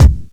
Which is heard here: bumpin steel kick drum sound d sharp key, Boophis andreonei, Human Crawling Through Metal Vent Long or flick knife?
bumpin steel kick drum sound d sharp key